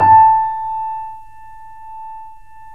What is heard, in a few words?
3D Pianos, Boesendorfer; E-MU Producer Series Vol. 5 – 3-D Audio Collection